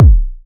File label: round kickdrum D# key, Royality free kick single shot tuned to the D# note. Loudest frequency: 144Hz; D sharp key; one shot